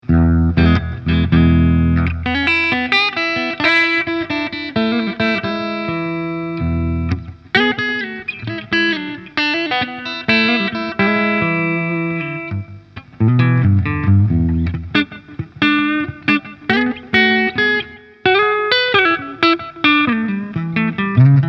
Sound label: Mooer GC 112 clean